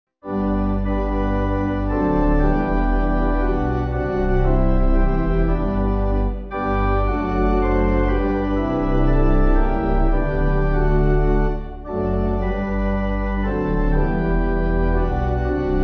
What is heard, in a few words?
Pipe; Be Known To Us; Belmont